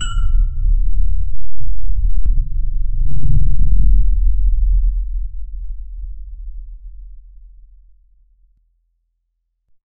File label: sonic logo